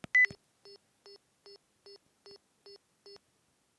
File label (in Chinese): 仪表滴滴声; SXElectricalInspection